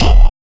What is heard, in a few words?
Sickly Hit, Fx; Sonic Foundry (Sony Creative Software); Esoterik Beatz